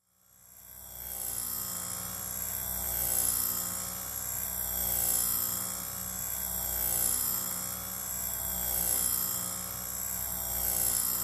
Small Electric Motor

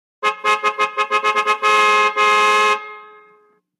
1960 Car Horn Large; 1960's

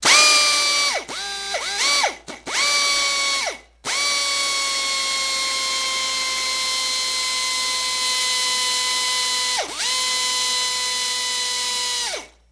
Saw Air Tool Epiderm, saws

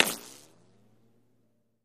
Bullet Ricochet Sin

